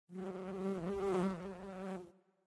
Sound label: Amb Birdvillage Bees